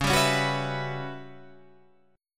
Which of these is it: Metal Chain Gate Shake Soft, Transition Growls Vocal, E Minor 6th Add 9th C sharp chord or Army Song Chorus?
E Minor 6th Add 9th C sharp chord